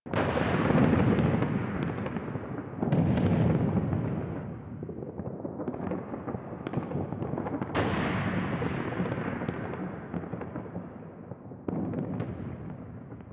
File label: Ambient Distant Explosions; Warframe; NineteenNinetyNine